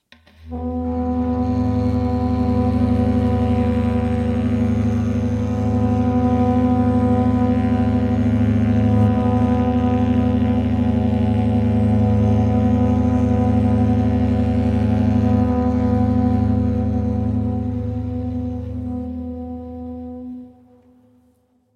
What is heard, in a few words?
A Flat Minor Prelude